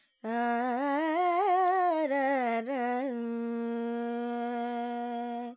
Dorian, Vocals Carnatic Female Alaps 70 G Dry

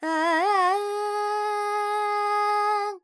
Charukesi, Vocals, Carnatic Female, Alaps, G, Dry

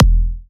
Kick Em; Sample Banks; Percussion

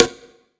Perc; Sample Banks; Percussion

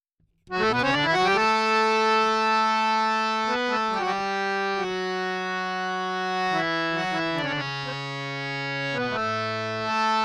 Dry DM 4-4 70, Harmonioum; Sample Banks

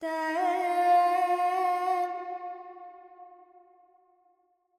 Verb Dorian, Vocals, Carnatic Female, Alaps, 70 G

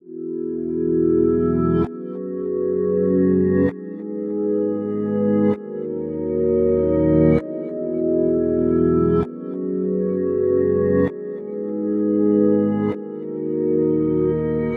Keys Reverse Chords G#m 65; Melody; Keyboards

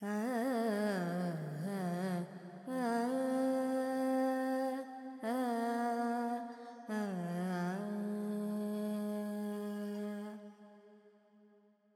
Pentatonic, Vocals, Carnatic Female, Alaps, G; Sample Banks

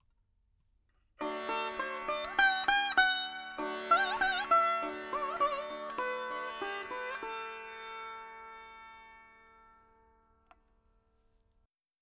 4 4 D 100 Dry, Melody Veena; Sample Banks

